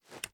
reload empty mag tilt, reloads; gmod

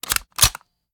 reload empty fast charge; reloads; iw8; uzulu; gmod

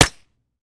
fire last mech; gmod; viper

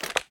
inspect xmag stock rotate; reloads; gmod

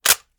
raise first close, weapons; gmod; viper

